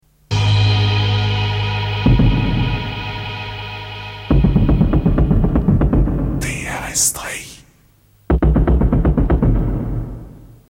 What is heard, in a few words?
tock tock tock